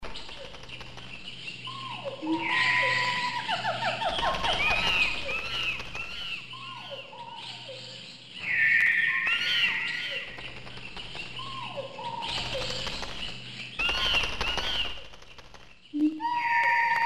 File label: jungle ambience